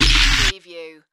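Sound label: Production Element Distortion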